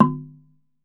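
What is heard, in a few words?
TUNG LO, Tongue Drums, Pitched Percussion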